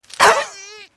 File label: Character Pigmies Hit; angry birds epic android ios mobile 2014